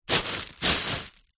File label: foley plastic packaging sheet short handling movement